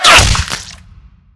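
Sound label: physical bullet impact flesh, violence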